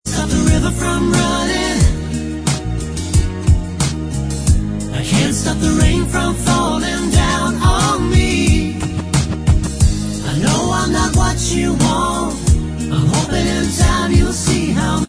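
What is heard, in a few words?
backing vocals, backing tracks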